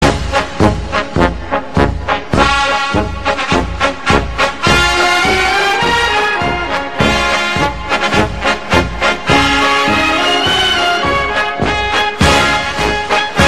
bayerischer defiliermarsch

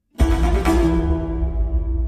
Nachrichtenton

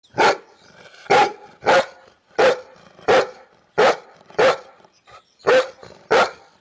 Hunde

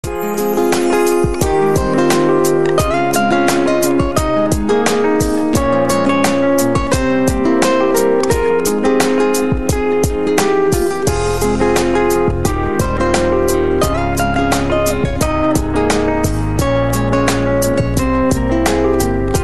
Gitarre Tune

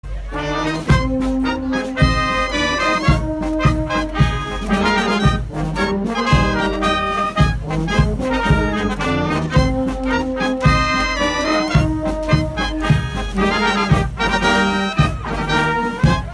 blasmusik

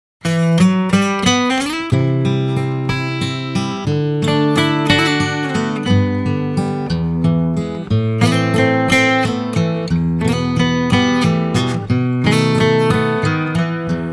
Gitarre

